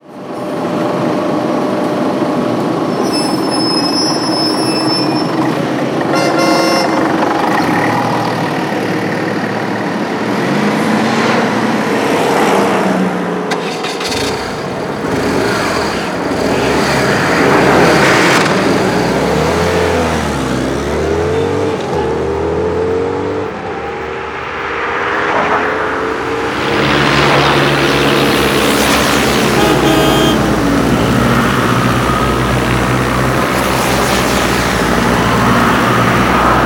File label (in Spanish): trafico; Contaminación Acústica; FUENTES DE RUIDO